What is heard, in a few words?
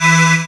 syn e, Dance Synths Stabs & Hooks; Best Service ProSamples vol.37